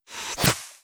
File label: Moss Knight Spit